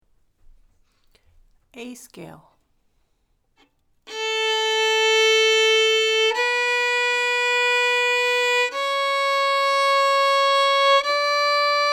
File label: Scales A Major single and double bows